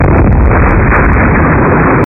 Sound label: SFX Airstrike